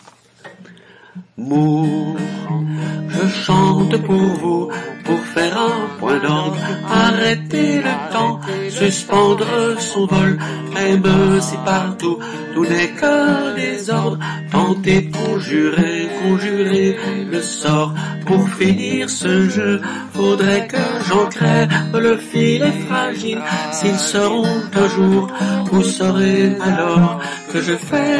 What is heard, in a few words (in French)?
SOP alt ho, chorale